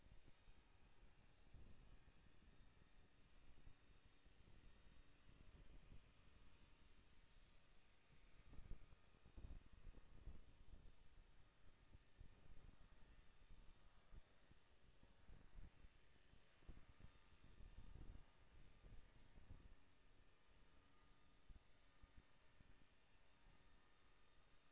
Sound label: HYDROPHONE 16000Hz; JASCO AMAR HYDROPHONE 740